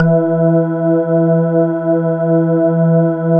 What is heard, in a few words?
CUSTOM E3, CUSTOM ORGAN